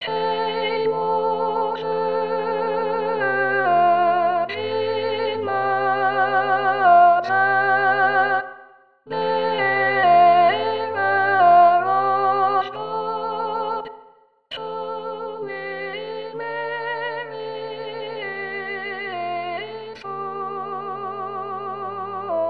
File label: Ave Maria Rachmaninov Sop; rehearsal tracks spring 2025